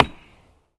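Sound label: knock on wood with echo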